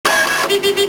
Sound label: Crouzet Validation Failed